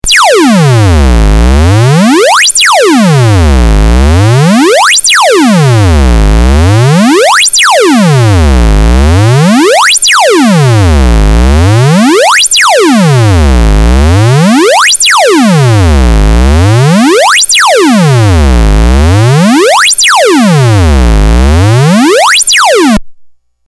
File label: arduino sweep fast